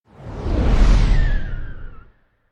Whoosh By Swift Fast Deep Whistle Reverberant Stopping; Hollywood Edge; sound designer's toolkit v2